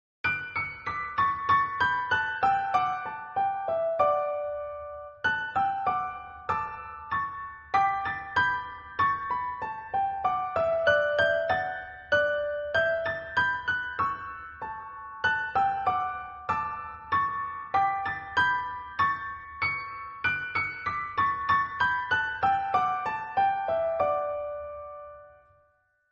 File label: Accompaniment Track